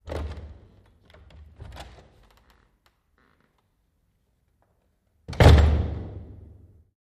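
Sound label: Door Open Close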